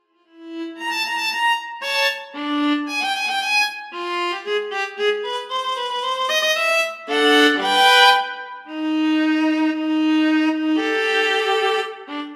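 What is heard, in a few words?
violin solo; vl solo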